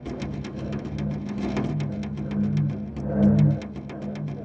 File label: SCI-FI